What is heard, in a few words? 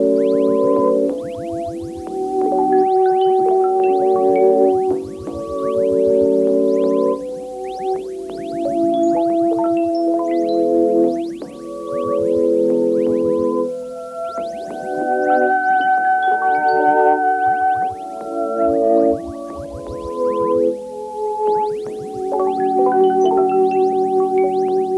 synth, Instrumental Loops; Crowdsourced Sample Pack; TRUTH-HZ